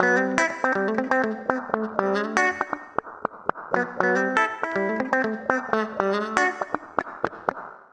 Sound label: Acoustic Guitar Loops, 120